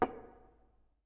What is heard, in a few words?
Colision Glasse